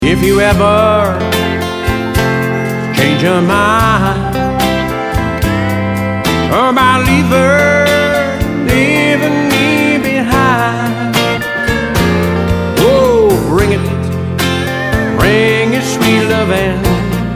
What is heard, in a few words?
ballad n blues